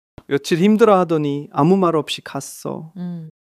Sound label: crying